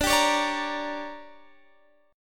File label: D Major 7th Suspended 4th Sharp 5th, Guitar DADGAD